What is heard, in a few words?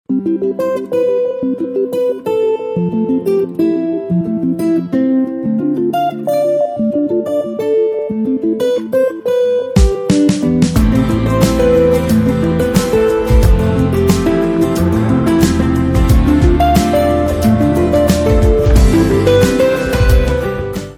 song instrumental; the sky is pink movie